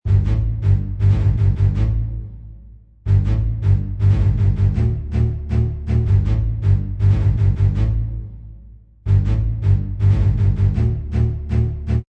Loop bass staccato Dm 160, Orchestral bass loop staccato Cm 160 bpm.; String Loops